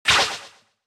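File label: attack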